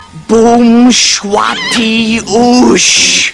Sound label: Boom Shwati Oosh